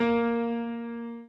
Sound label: Piano Chord